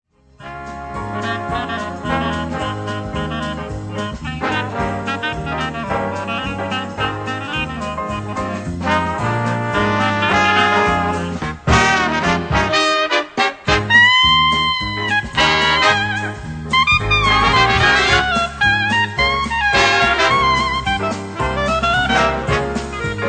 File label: Foxtrot; Foxtrot Society